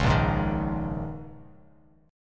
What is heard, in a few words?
Bb Minor 11th, Bass Standard, Bbm11 chord; strummed; JGuitar